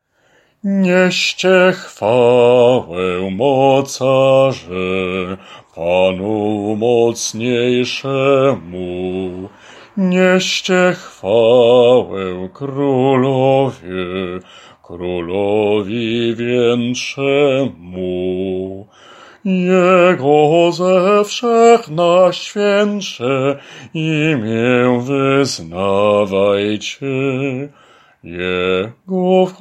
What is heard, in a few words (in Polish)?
BAS; Niescie chwale